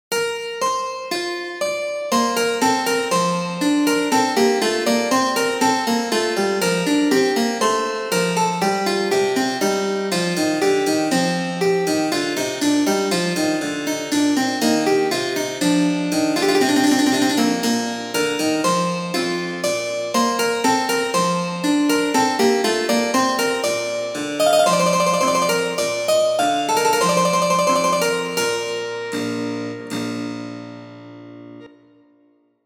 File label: invention stop1 wet